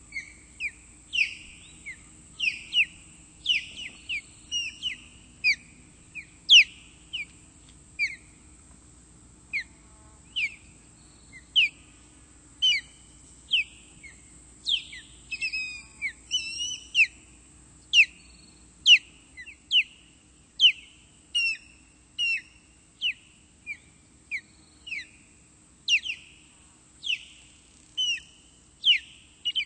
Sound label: Osprey; Birds